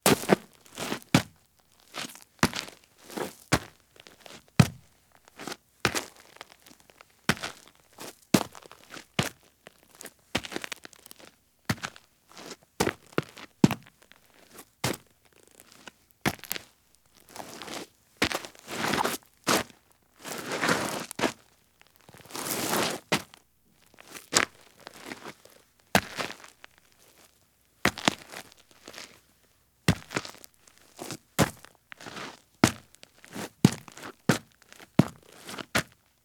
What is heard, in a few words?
Heavy Boots Do Various Scuffs on Roadside or Driveway Gravel; Sound Ideas; Footstep Sound Effects